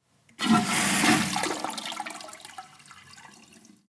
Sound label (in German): WC Spuelung